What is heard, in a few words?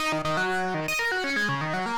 Lead; PatchFactory